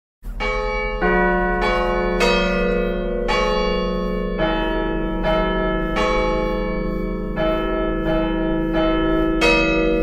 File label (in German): glockenspiel; grengiols